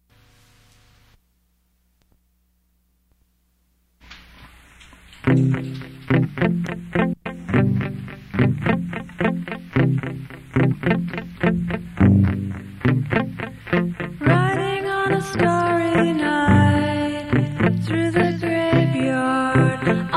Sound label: bedroom guitars